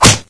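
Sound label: fist hit body; dn3d r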